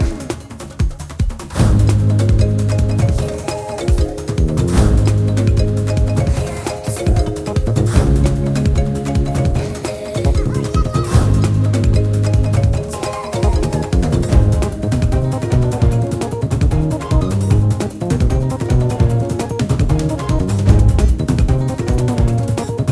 music main game day; serengetikings

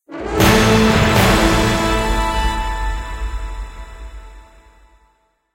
multiplier level up fanfare; rome

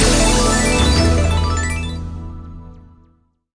large Win; twin spin

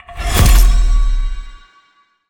bonus turn first; silverback

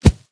free Spin Count Up; asgardianstones

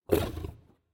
lion fx low; serengeti kings

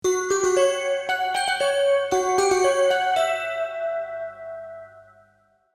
Music Box Main Melo; knight rider

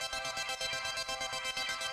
free spin music outro; spinsane

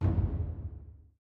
paytable button down; lost relics

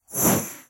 wild spread; rome

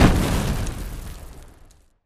block explode; pyramid

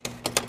paytable Slide; eggomatic

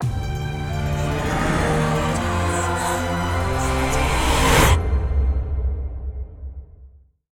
outro Free Spin; dracula

